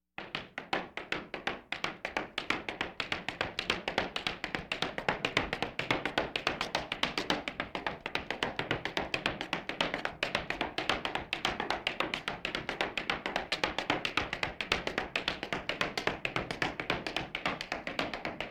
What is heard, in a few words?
Laugh tracks